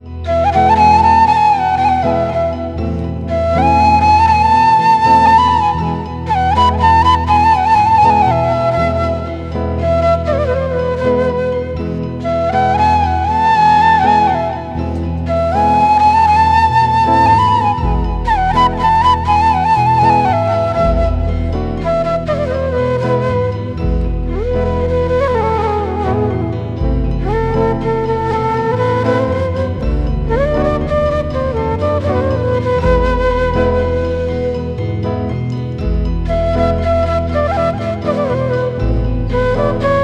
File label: Harmonic Ringtone